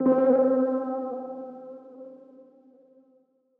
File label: KEYS Swirl (C); One Shots